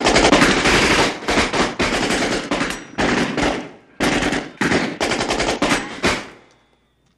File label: Gun Fight Vari Shots, modern